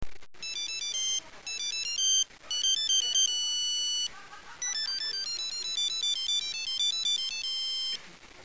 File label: ringtone; repton